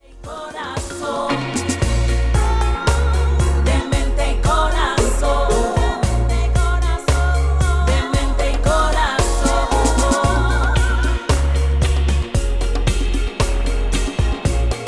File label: Dub